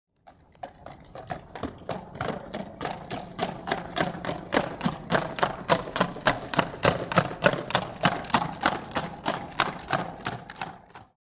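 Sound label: By Horse Up Past At Trot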